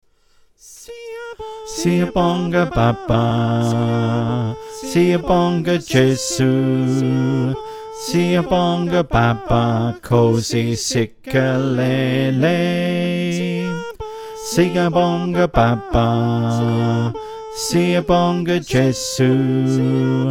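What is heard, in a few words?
Bass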